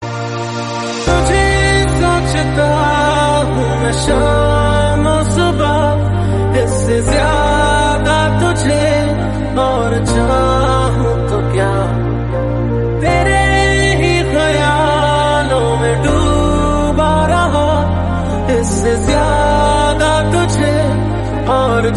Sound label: romantic mashup tone